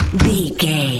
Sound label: door close slam slow